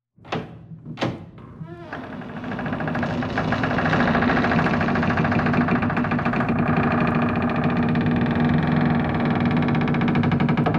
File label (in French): Porte en bois qui grince